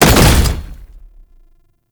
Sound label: Wall Climb Impact; Warframe; Oraxia